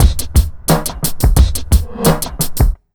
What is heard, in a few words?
88 BEAT, Pure Electro, BEAT MIX